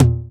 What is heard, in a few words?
GROOVE TOM, Drums Dance & Groove; USB Soundscan vol.11; AKAI; GROOV KIT